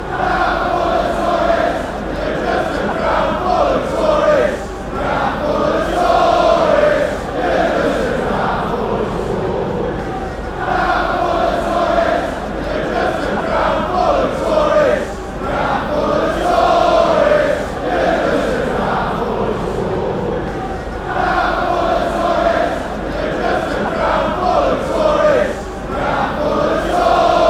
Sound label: fanchants